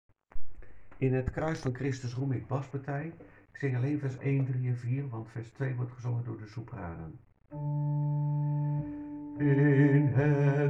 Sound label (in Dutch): In het kruis van Christus roem ik bas